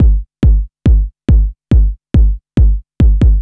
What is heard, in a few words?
Kick Loop, Drums, Dance Beats